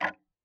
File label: Tick, Clocks Kitchen Clock